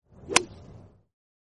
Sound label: hitting a golf